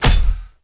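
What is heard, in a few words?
element staff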